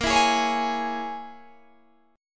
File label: Bb Minor 7th Flat 5th, Guitar, Open G; 20,21,18,18,21,18